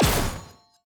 tower basic Attack; elven Stargazer

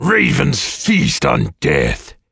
Varl Berserker death

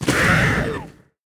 dragons enemy lava basic evolution; sfx

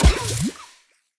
tower demon Pit basic Attack

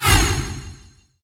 archmage attack

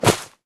net hits floor

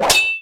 Soldiers Fighting

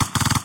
drones attack; wilbur; metralla

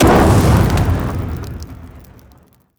heroes carpet Bombing impact; broden

